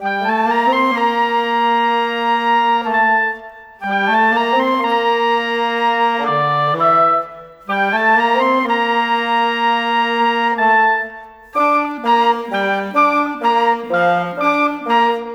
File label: Winds, Orchestral, Rock Pop